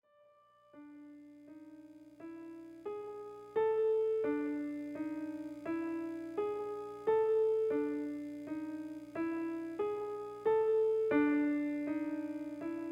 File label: Symphony in Raga Salagam